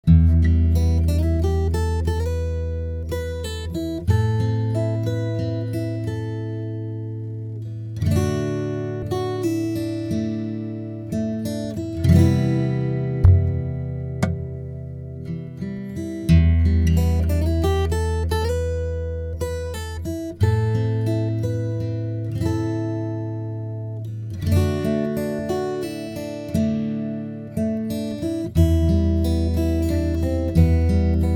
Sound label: 3 pickup non rev